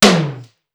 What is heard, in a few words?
Simmons SDS2000; SDS2000 Factory8